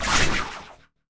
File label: jump